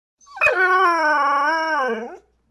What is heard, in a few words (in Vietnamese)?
am thanh tieng cho lon ren ri